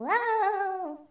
ooh; toadette; sm64coopdx; char-select-extra-chars

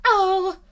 ooof; peach; char-select-extra-chars; sm64coopdx

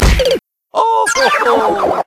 sound event death; omm-coop; Mario; sm64coopdx

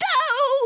doh; Toad; sm64coopdx

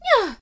uh; peach; sm64coopdx; char-select-extra-chars

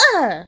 uh; toadette; sm64coopdx; char-select-extra-chars

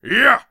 yah; Wario; sm64coopdx

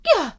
uh; peach; sm64coopdx; char-select-extra-chars

